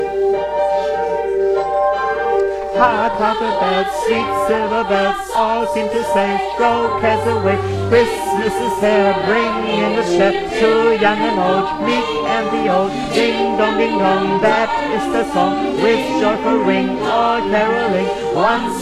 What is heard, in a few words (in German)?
Sopran, gospelchor